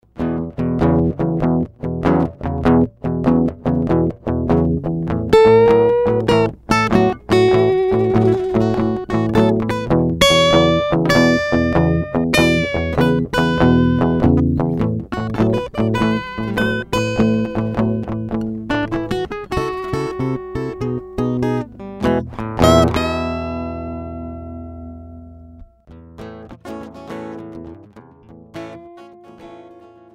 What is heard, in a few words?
BLUES